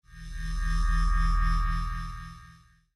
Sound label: Abyss; Blind Quest - The Frost Demon